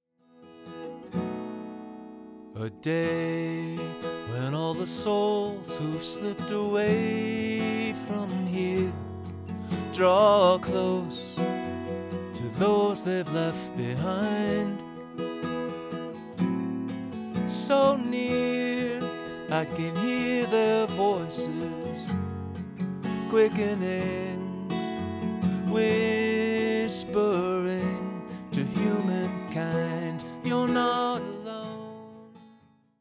vocals without

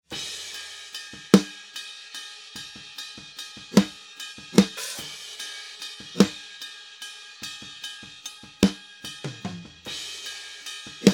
snare without; auburn sound renegate

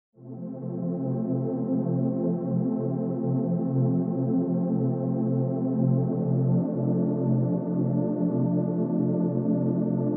pad without

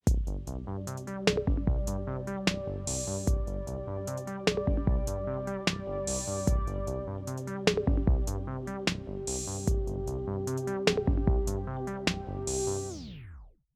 reels; audiothing; jp 375

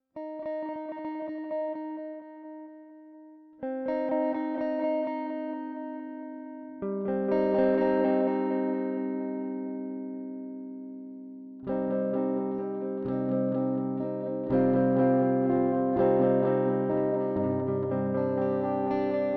echorec guitare; mint

